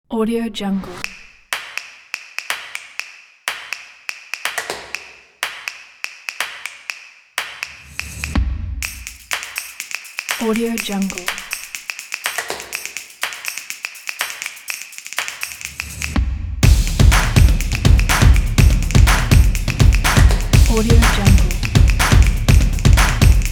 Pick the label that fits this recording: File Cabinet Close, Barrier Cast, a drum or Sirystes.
a drum